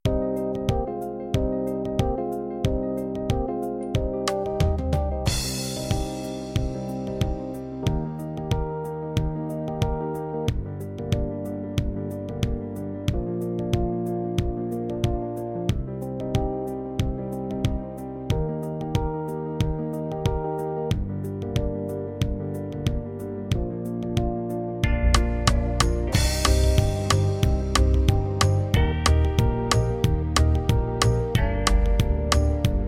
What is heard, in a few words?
70s Country, rhythms; mzx500; preview pack 02